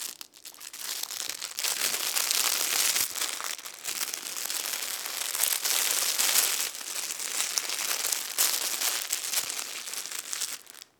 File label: Swishing Plastic Foil